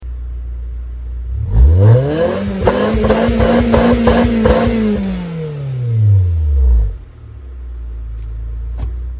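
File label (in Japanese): HKS Dr, 92LEVIN+HKSドラッガー レーシング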